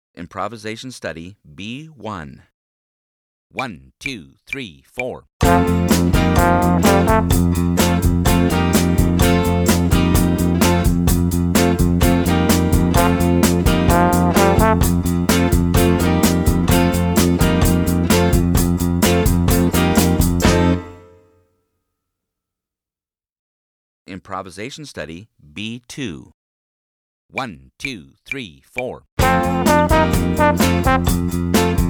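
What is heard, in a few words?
improvisation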